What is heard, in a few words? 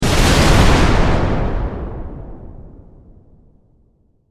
Explosion Massive; Explosions